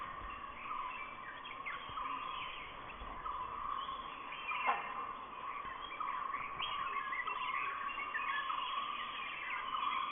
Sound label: great hornbill; Biligiri Rangan Hills